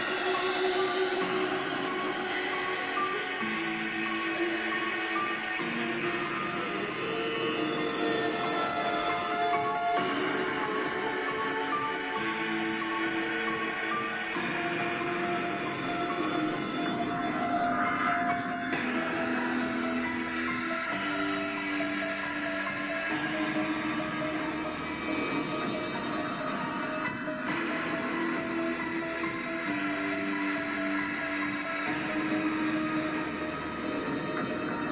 creepy music

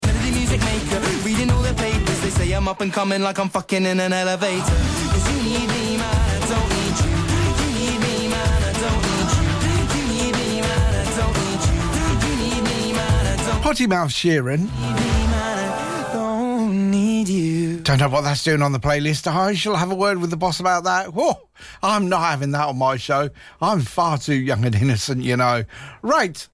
Suffolk sound going down in an elevator

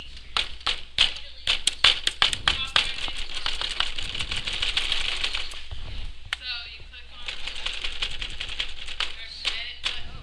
thunder

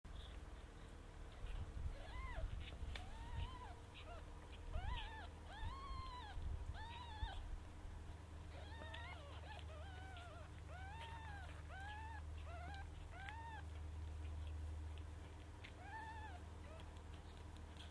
kittens meowing

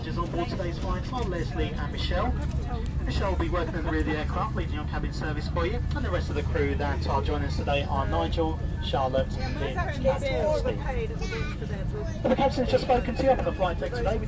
plane

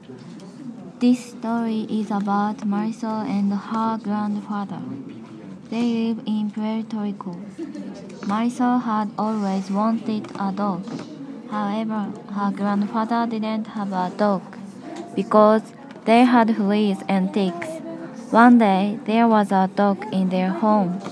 outside dog

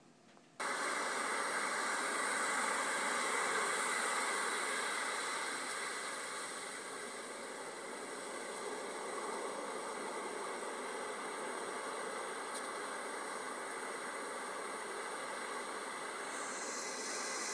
manhattan beach wave action

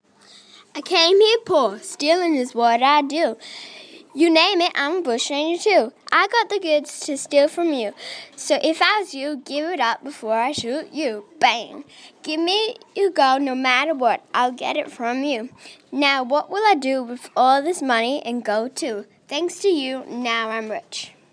rap

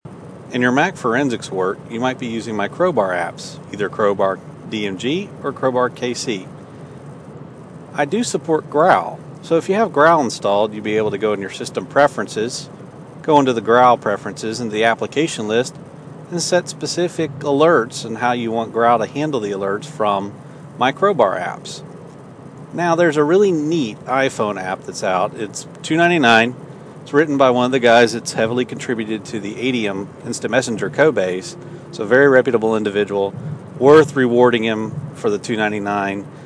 crowbar and iphone notifications

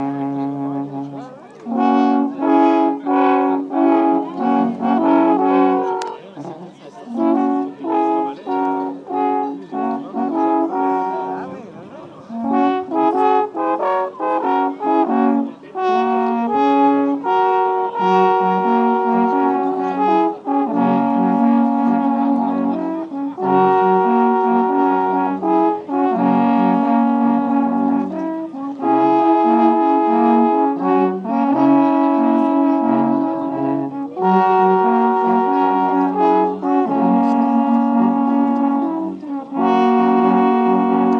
Swiss horns

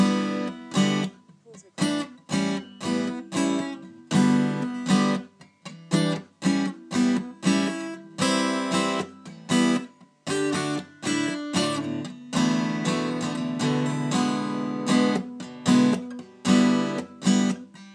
live acoustic guitar

